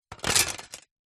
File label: plastic shards broken thin pieces set down; zapsplat